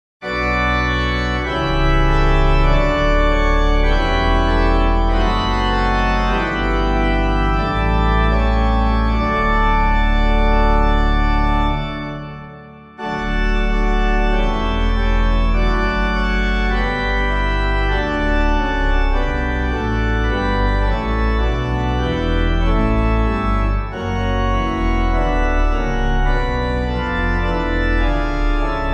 organ, hymn; Christ Our Lord the Prince of Ages; LAUDA ANIMA; Clyde McLennan

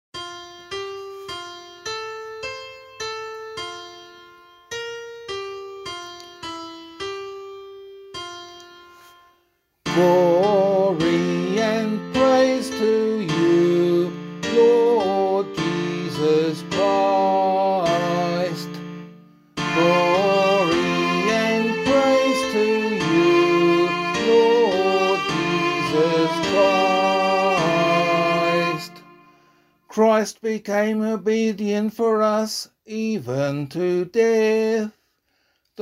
Good Friday Gospel - vocal, Gospel Acclamation

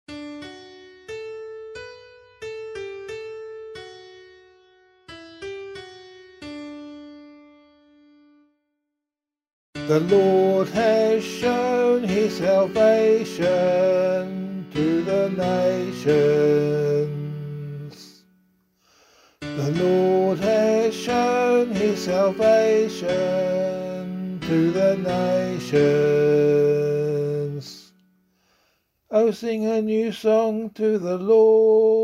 Psalm C vocal

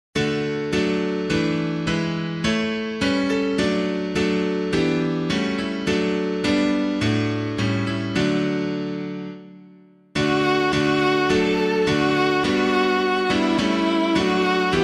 piano

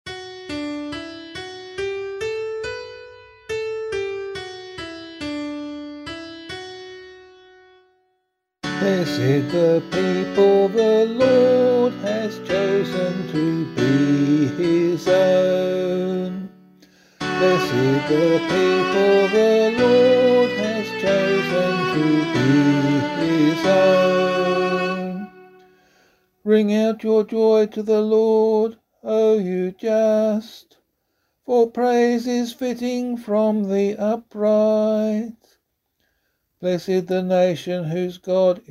Psalm C vocal

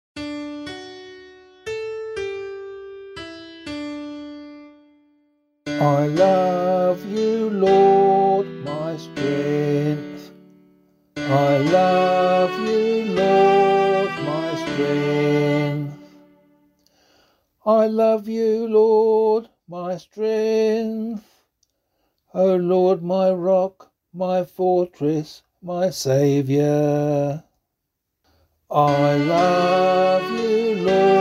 Psalm, vocal

